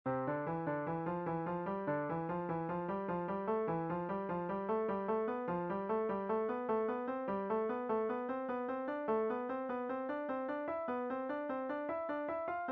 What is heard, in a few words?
Five finger step, piano